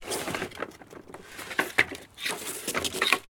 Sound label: Wood Foley Movement; InMotionAudio